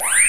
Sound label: Lose; The Blackjack Lab 2.0.1